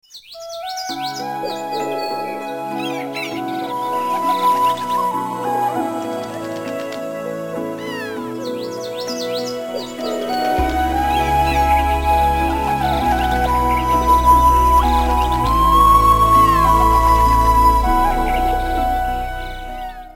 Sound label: birds; titanic